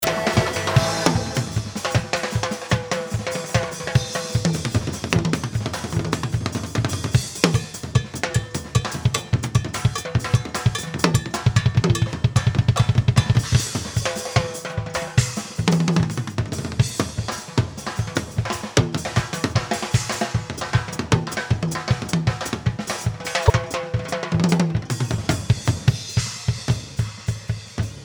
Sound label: DRUM SOLO